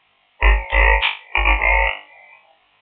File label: Robot Engage, Sound Design